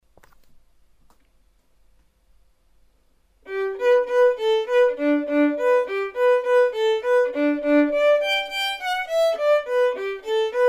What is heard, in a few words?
vln perf; Performances have no metronome click in background; Building Technique with Beautiful Music, Book 1 Violin